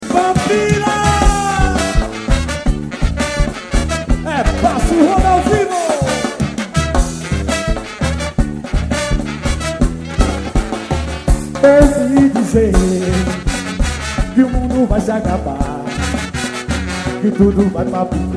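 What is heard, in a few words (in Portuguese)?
trombone de prata, orquestra de frevo; orquetra de frevo passa o rodo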